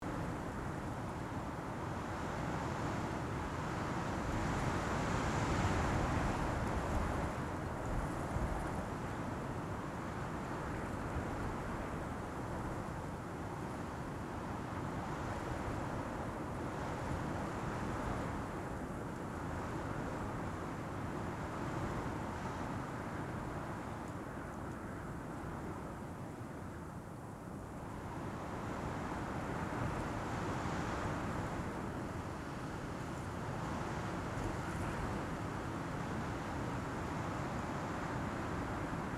amb hiver; deadmaze

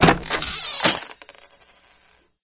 coffre

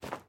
pas; deadmaze; marche

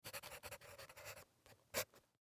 ecriture; deadmaze

